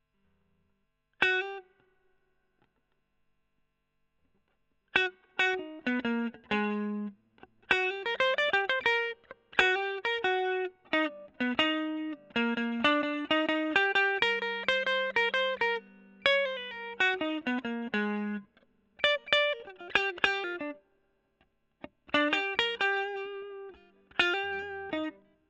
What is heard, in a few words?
E.GTR Gefell Solo